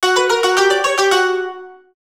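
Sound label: Triumph E-mail, ringtones; Sony Ericsson; PlusFourSix; Lars Edvall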